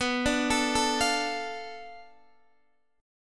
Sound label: B Minor 7th Flat 5th B Guitar Open D